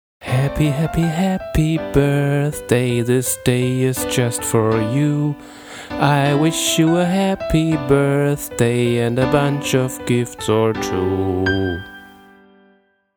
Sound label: Birthday music; kharehi